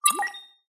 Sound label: science fiction computer burst noise beep